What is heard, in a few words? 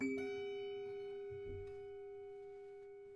Prepared Piano